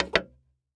Foley Footstep Hard Sole Dress Shoe Single Step Up On Wood Board Walk; Foley Footsteps